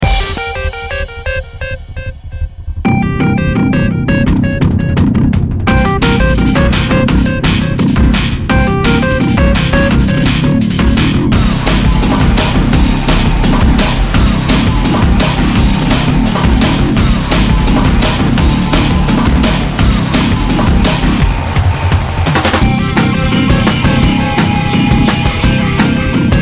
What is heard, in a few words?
chicken